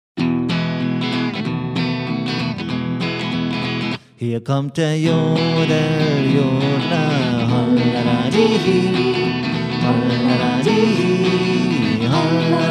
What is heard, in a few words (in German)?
Der Jodel Jodler